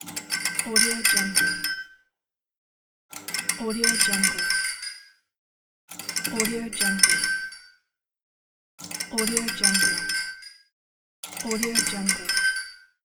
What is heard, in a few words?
neon light on flicker